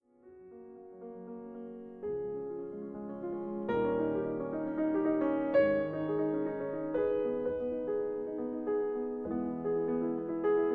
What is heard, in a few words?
G flat major, piano